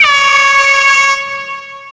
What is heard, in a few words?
Air Horn L